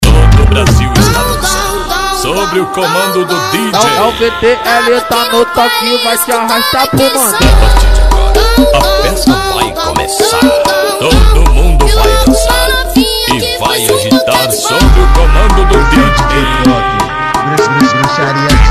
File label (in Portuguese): sound car funk; street